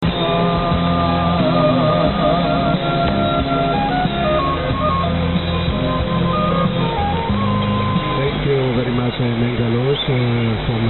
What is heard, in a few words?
1620 kHz Radio; North Sound GRC